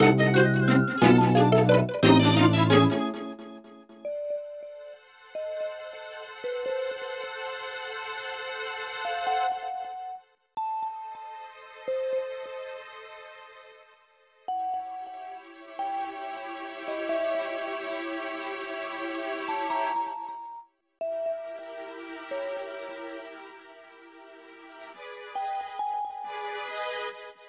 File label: Jingle Gameover; Rooms: The Main Building